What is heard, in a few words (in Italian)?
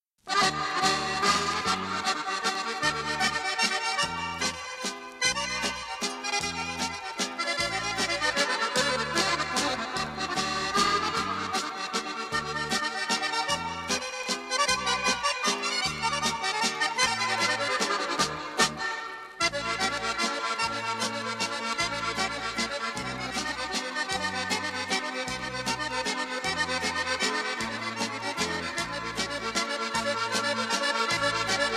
MAZURKA VARIATA; CELEBRE